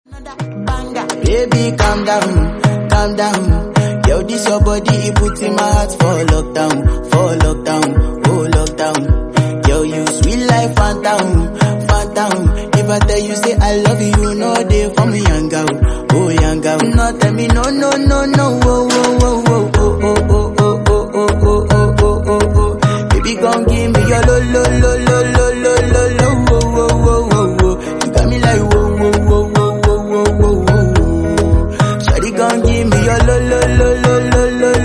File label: English Song Ringtone